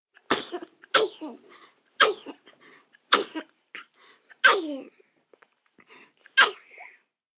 Sneeze Multiple, Sneeze, 1 baby, cute sneezing fit, human voice, kid, child, infant